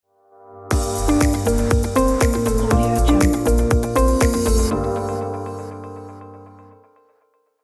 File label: modern corporate logo